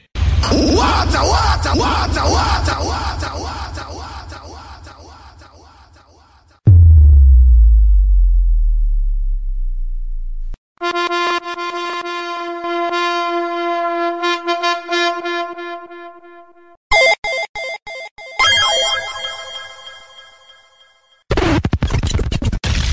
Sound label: DJ Sound Effect